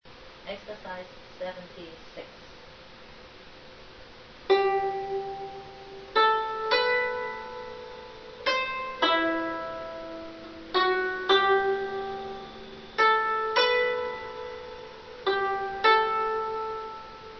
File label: zheng